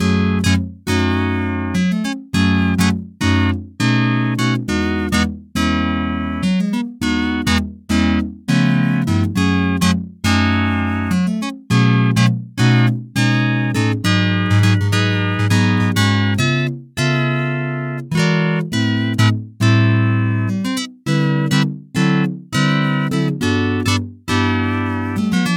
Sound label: Cool down (busker version); intradiegetic